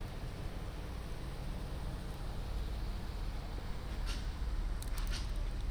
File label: Car Engine Hum; Brother's Birthday